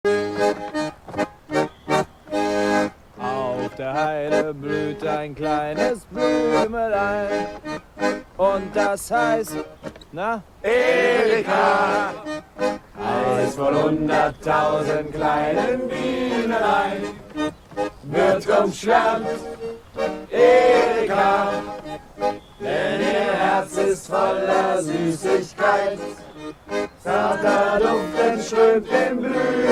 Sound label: German Erika Song; from the Movie Persian Lessons